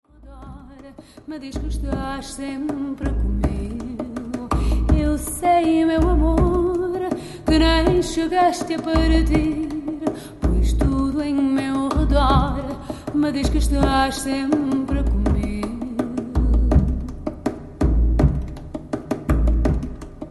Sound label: trio fado